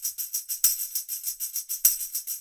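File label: 100 tambourine; Zero-G; Monster Beats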